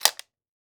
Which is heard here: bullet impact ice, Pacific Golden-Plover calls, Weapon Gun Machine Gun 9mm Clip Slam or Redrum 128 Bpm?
Weapon Gun Machine Gun 9mm Clip Slam